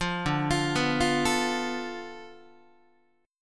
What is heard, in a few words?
D-Minor 6th, D, Guitar, 4A, 4E, 5A, 5D, 5G sharp, 6C sharp; 8,10,8,9,9,8; Dm6 arpeggiated